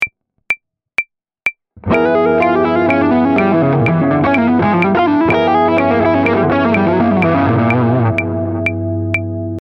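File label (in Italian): Lick 3, SCALA DIMINUITA SEMITONO-TONO lick, Jazz fusion; Guitar Club Marzo 2025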